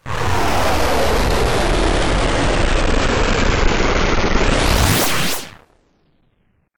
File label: whoosh; Whooshes and sweeps